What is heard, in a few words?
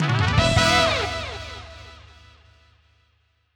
Winner; Wild Guns Reloaded; Reloaded Ver.